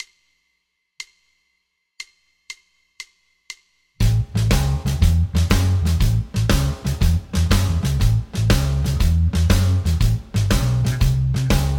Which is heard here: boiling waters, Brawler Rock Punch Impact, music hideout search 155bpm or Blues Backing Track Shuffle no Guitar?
Blues Backing Track Shuffle no Guitar